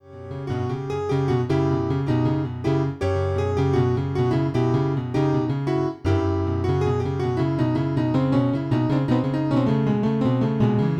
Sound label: Train a riff